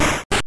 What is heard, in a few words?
Door enter; Pokemon Essentials; PKMN Estelar 1.3